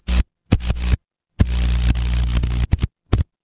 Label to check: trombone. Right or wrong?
Wrong